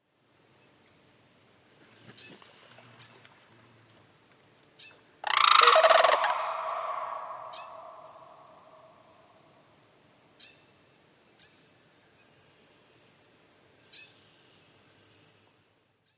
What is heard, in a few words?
Sandhill Crane